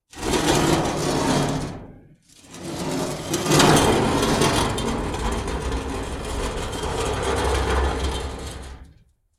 Just Metal Scrapes and Scratches; soundbits

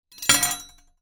knife down on glass table

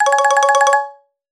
app alert tone ringtone

